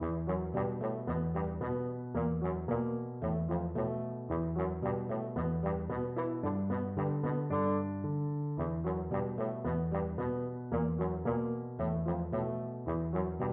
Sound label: bassoon; grieg mountain king